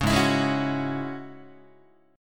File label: G sharp 7th Suspended 4th Sharp 5th G sharp, Guitar; G#7sus4#5 strummed; 4,4,7,6,5,5; JGuitar